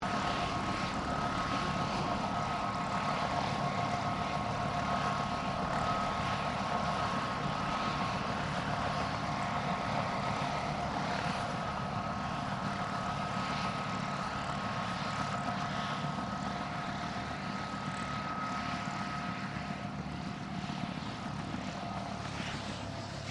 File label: Helicopter Shutdown; Various commercial helicopters; Vehicles; Sound Effects:Vehicles